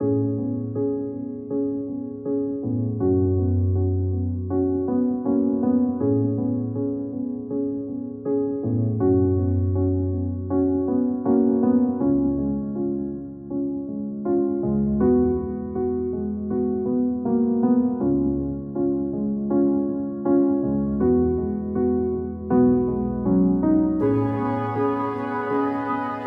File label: Ambiance Chill